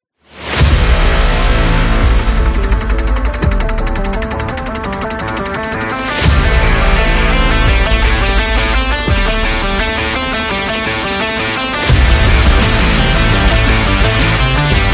Rock Epic

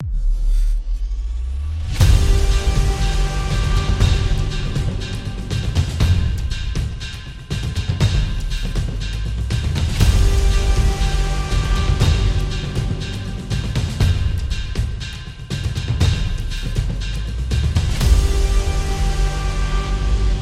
The Epic Drums; mystery

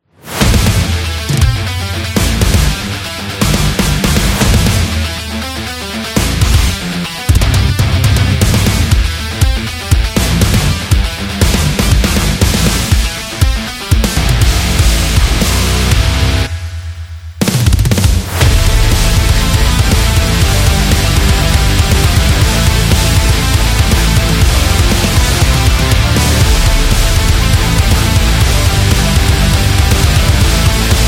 That Metal

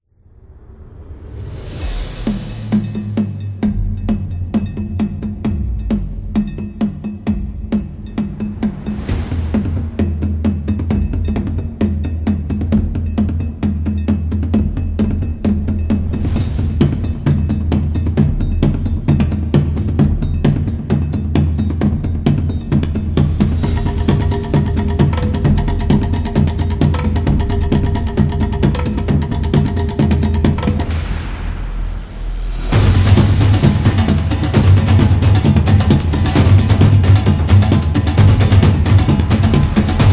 Africa Percussion Tribal

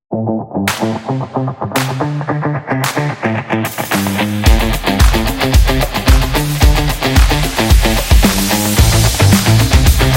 Funky

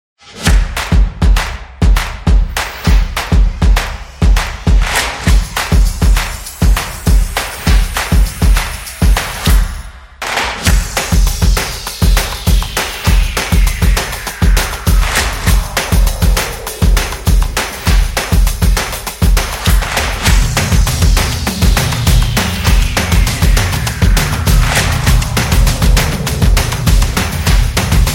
Fast Percussion

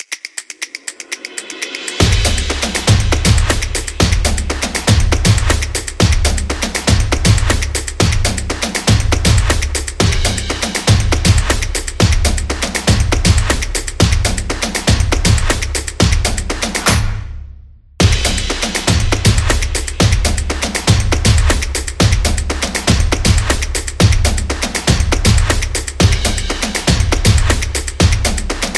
The Percussion

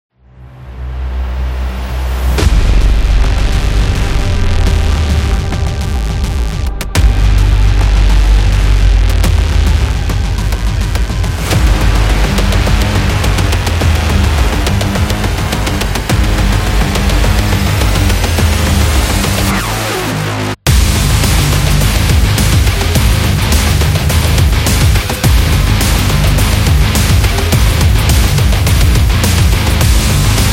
Game Metal

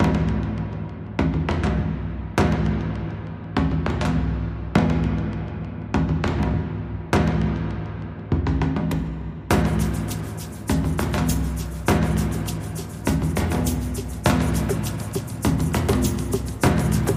African Drums

